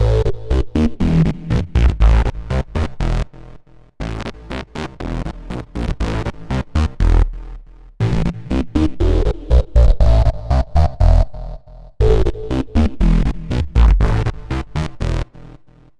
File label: Synth Pattern; Electrocution